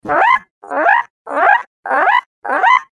creature coo funny

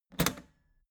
old button heavy hard push

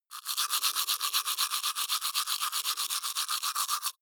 teeth brush dry open molars

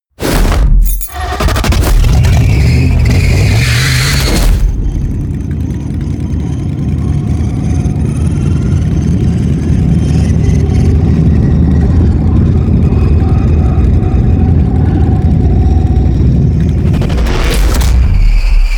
promo pad

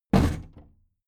big plastic case lid put down regular

